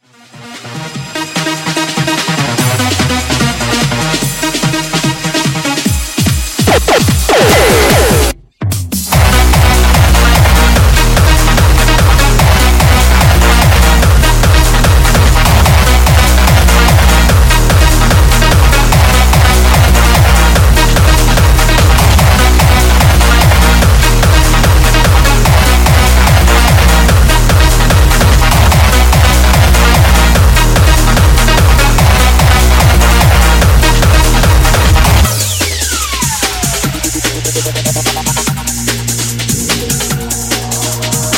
Dark Triad hard Speed Up

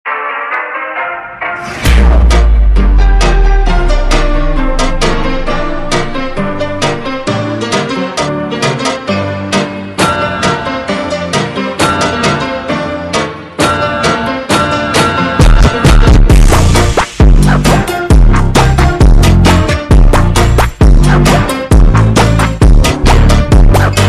Funk ultra Slowed Instrumental

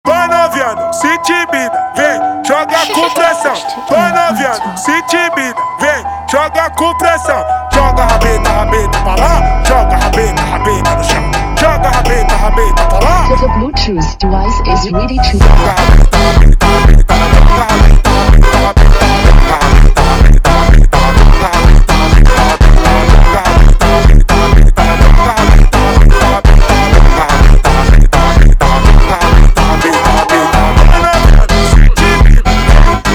Funk slowed Reverb, funk

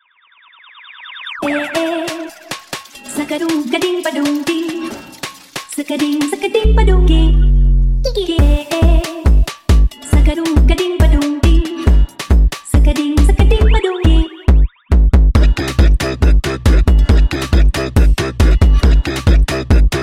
Automotivo super Sped Up

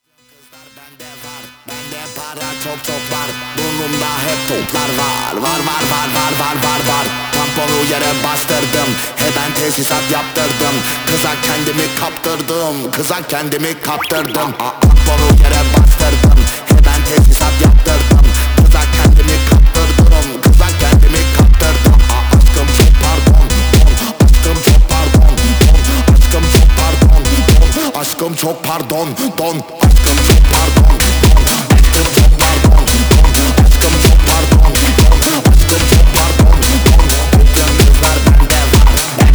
Funk

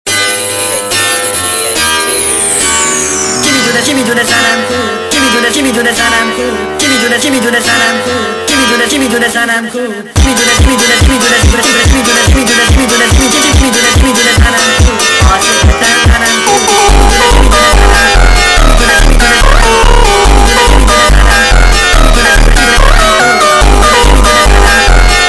Funk sped Up, funk; فانک ایرانی

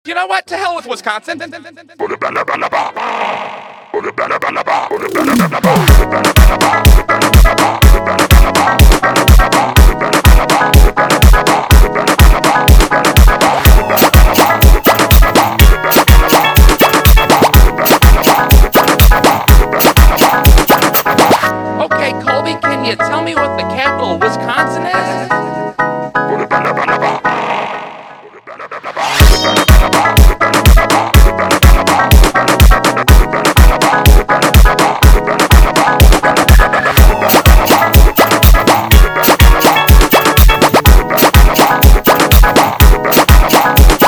Funk slowed

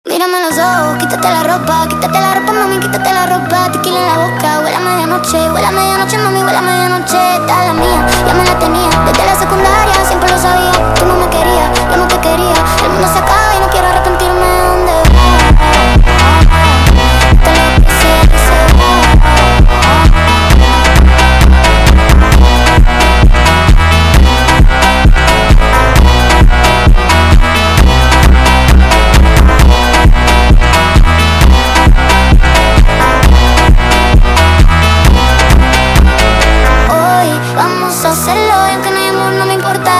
sped Up, funk

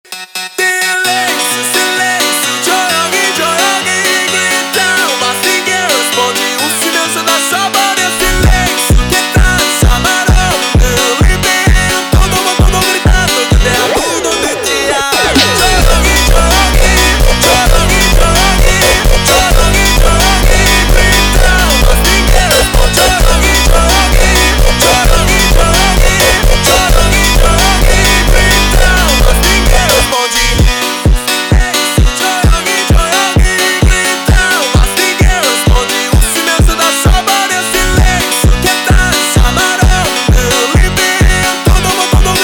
Funk

